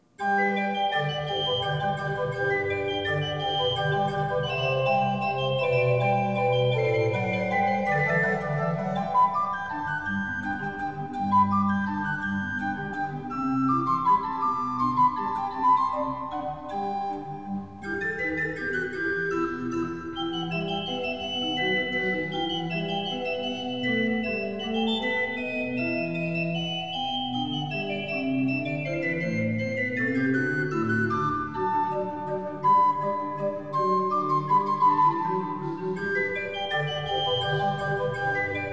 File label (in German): Menuett in G-Dur; biggs bach book